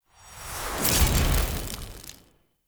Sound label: Fire Blade Cast; Weapons; Enhancements; Elemental